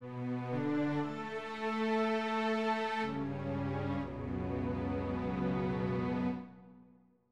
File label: lose music